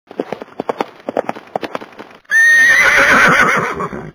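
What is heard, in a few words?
Whinny Coo, cucù con gli animali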